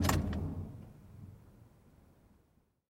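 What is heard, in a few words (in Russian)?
негромкий звук открывания металлической защёлки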